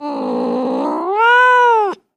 Cat Domestic Growl

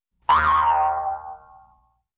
Jews Harp Deep Vibr, boings

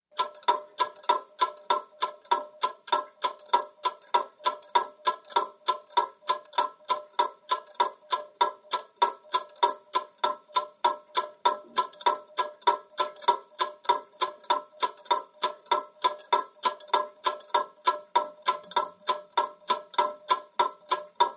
Old Mantle Clock Tic

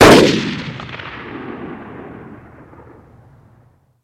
Gun AR-15 Single Shot Fire

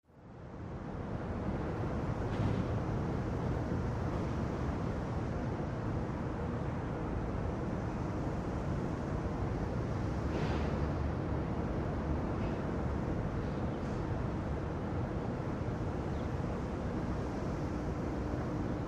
Traffic Off Screen

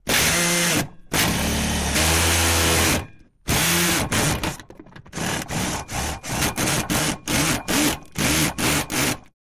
Machine Drill Hand; drills

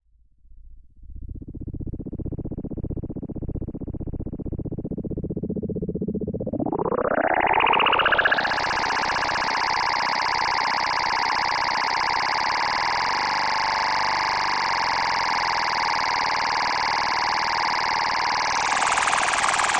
energy beam

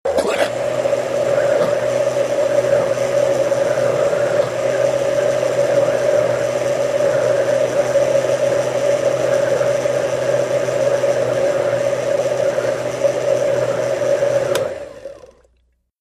Blender Slw On Slurp; appliances

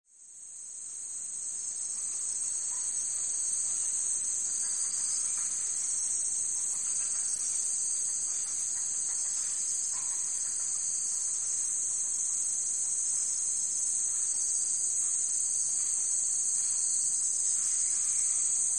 Steady Heavy Insect, jungle

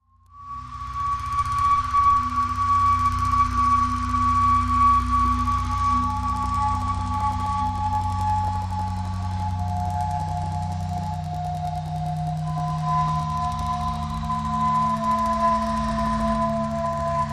Flute Washer Machine H, musical

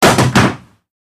Gun Hits Concrete, handgun

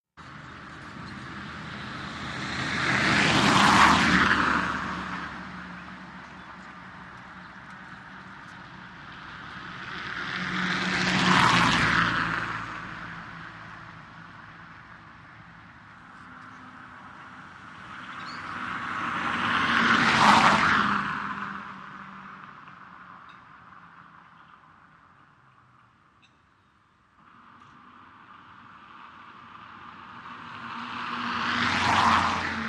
Holland Cobble Stn St

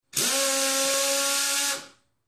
Air Drill Straining

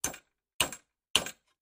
hammer hammering

